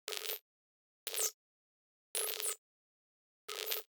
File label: Short Glitch